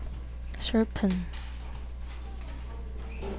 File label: sharpen